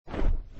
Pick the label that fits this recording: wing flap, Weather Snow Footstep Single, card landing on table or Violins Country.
wing flap